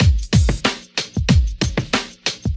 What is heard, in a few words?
123 bpm 90s rap drum beat d sharp key